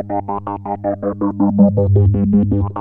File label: 3100 G 3, Korg PS3100; Back In Time Records; Korg Universe VOL-1